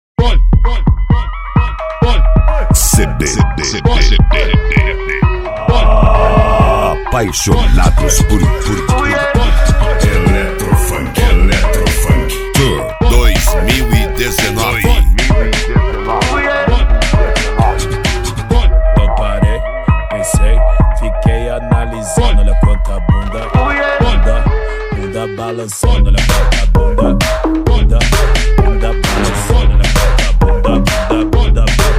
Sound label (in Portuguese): eletro funk